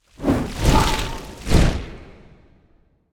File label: phoenix death; smite; npc